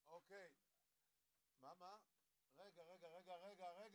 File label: SNR